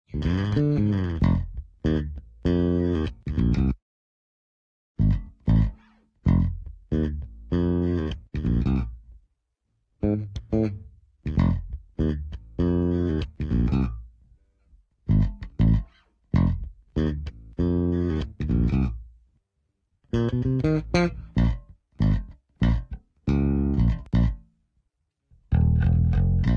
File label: Base Bass